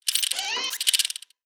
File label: Broombot Whirl, creatures mechanical; Inventor Broombot